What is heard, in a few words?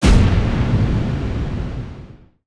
retaliate cast; weapons; dota; centaur